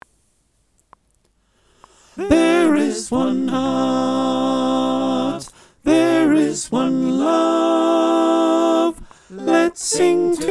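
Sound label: alto, choir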